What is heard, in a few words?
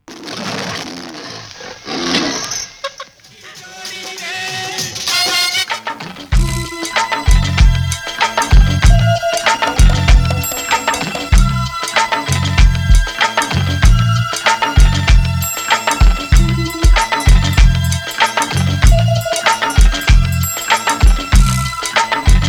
organ stroll